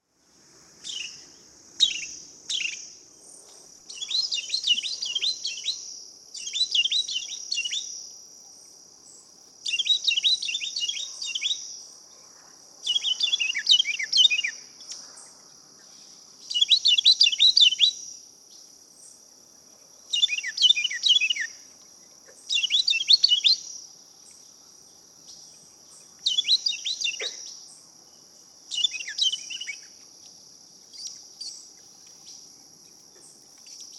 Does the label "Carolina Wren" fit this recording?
Yes